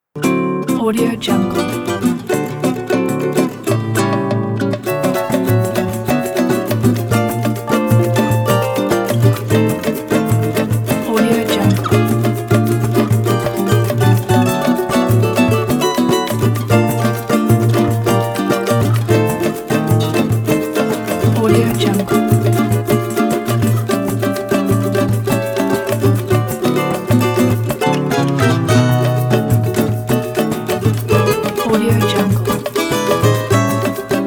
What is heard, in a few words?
samba background